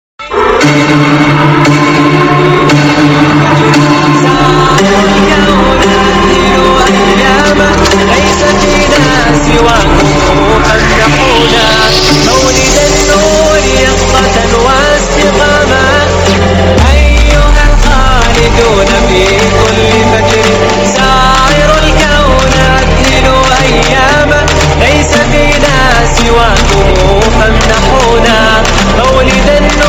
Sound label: arabic trap